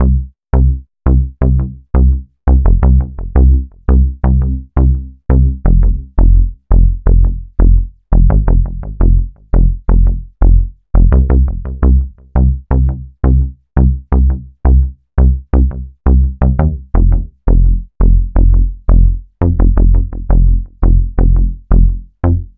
Bass, Rock

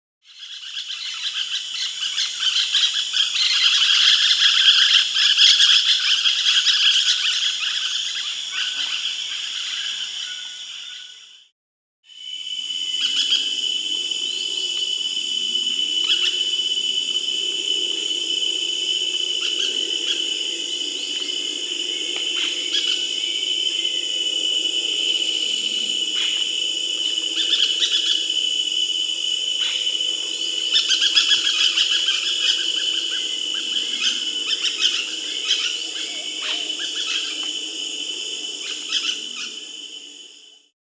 Painted Parakeet (Pyrrhura picta), Peru; S. America

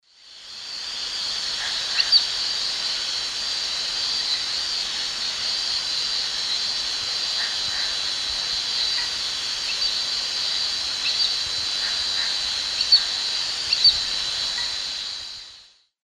Pteroglossus castanotis, flight calls; S. America; Eastern Lowlands

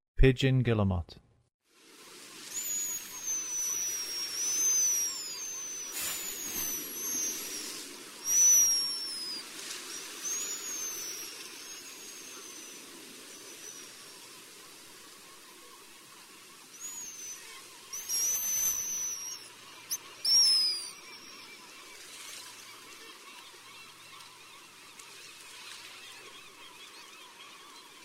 Pigeon Guillemot; North America

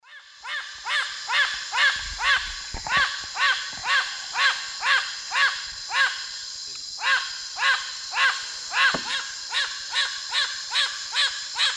Amazona festiva, flight calls; S. America; Eastern Lowlands

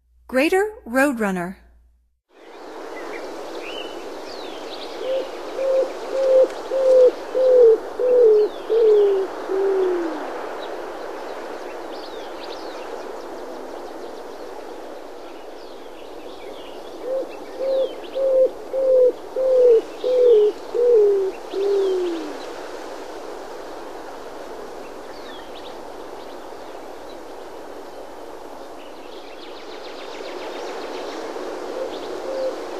Greater Roadrunner; North America; Stokes

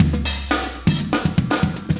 chique 120, loops, 120bpm; Sound Effect Library

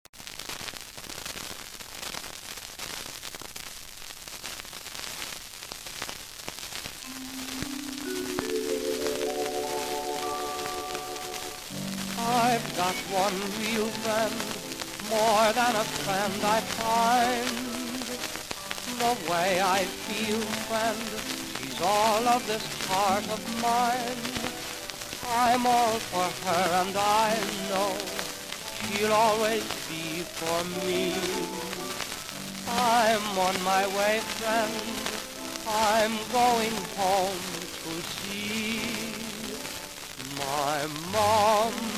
vocal, Jazz; Clarion; Popular Jazz Archive 1920-1936

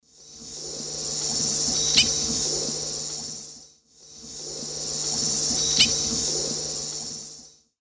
Dolichonyx oryzivorus, flight call; S. America; Eastern Lowlands

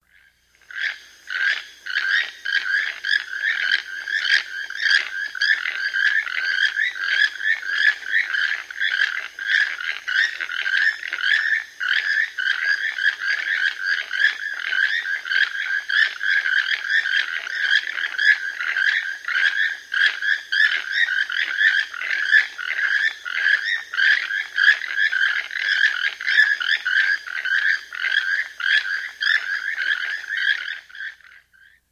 Keel-Billed Toucan; S. America

